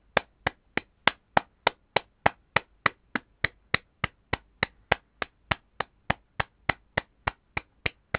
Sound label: clap hands